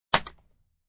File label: A thin piece of wood is splintered; Splintering (Sound Effects:Objects)